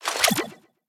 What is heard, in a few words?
pistol loading